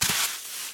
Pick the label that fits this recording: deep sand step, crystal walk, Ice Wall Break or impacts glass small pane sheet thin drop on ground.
deep sand step